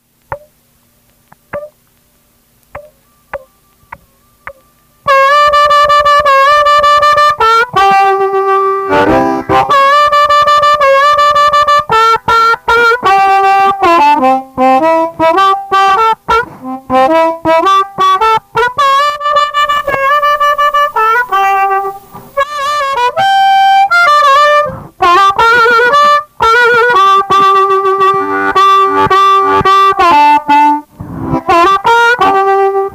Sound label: blues, harmonica